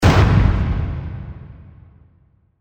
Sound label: explosion